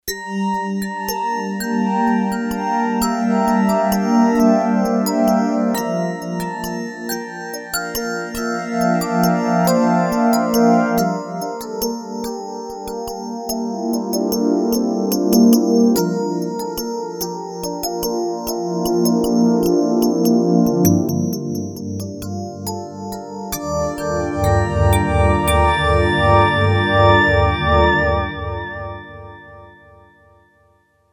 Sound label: Ting Voice, Yamaha TX802; Factory Patches; Voices